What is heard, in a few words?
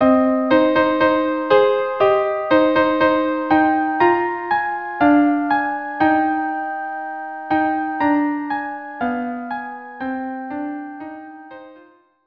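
ma nishtana violin duo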